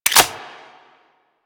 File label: Grenade launcher Mech; Warframe; Weapons; Corpus